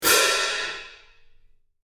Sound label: Italian cymbal crash medium